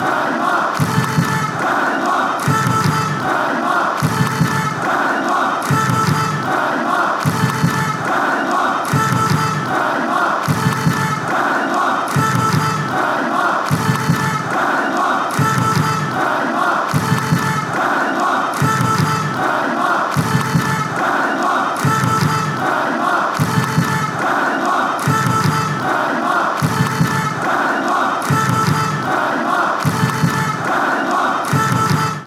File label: denmark clap, fanchants